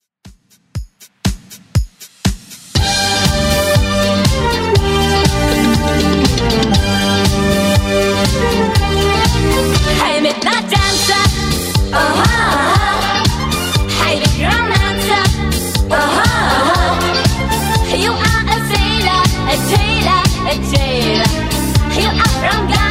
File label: 70s, 120bpm